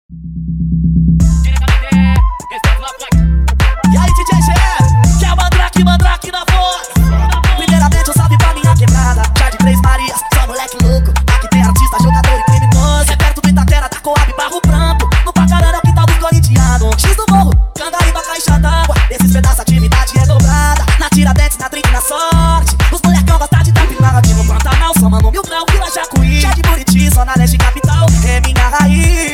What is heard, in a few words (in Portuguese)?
som automotivo